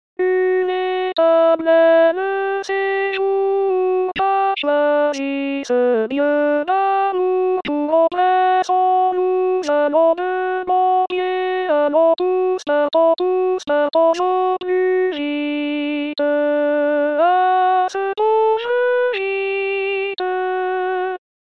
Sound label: Alto; ALLONS BERGERS ALLONS TOUS; noel